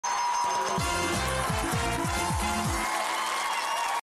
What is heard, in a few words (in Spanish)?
Musica de triunfo; 100 Mexicanos Dijeron